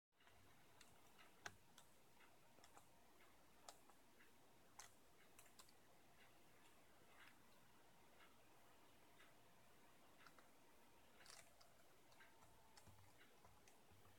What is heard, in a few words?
server flicker